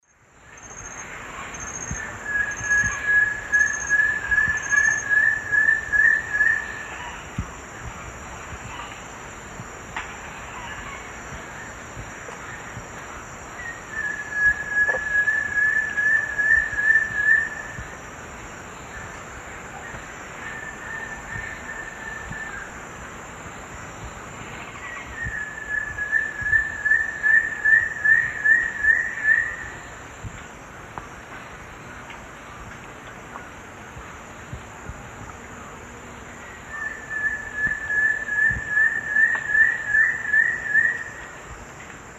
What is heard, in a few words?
Frederickena unduligera, song; S. America; Eastern Lowlands